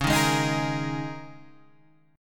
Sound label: G sharp Suspended 2nd Suspended 4th C sharp, guitar